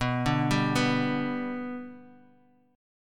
B Minor Sharp 5th, Guitar; arpeggiated; JGuitar

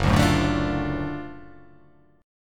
F sharp Minor Major 7th Sharp 5th

